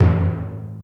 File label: march tin